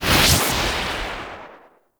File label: Tengu Air Burst Cast; Warframe